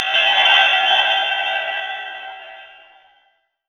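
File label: spinnin bell, percussive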